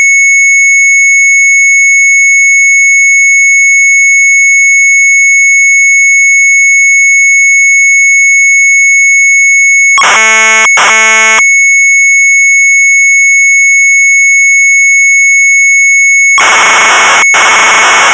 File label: MZ800 Tape; DIAGONAALWEB; sharp; retromelsarchive